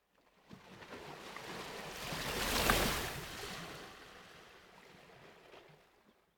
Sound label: Ocean Gentle Water Lap On The Coast Surf; Hollywood Edge; sound designer's toolkit v2